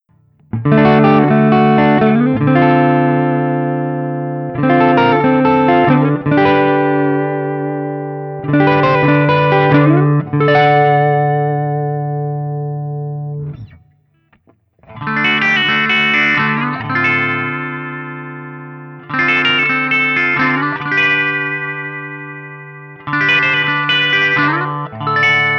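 Guild Burnside Crossbow Stock ODS100 D, Clean, D-Shape; Burnside (by Guild) Crossbow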